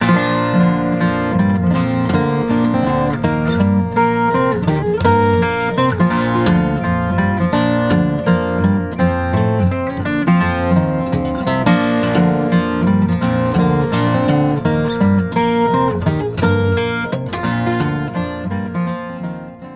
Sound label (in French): Guitare a 12 cordes